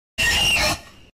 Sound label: screech